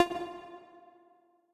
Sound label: Tessellation Move C